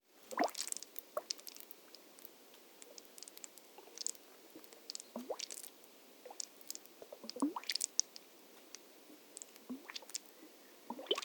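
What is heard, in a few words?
Water Bubble Bubbling Spring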